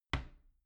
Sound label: door wood close soft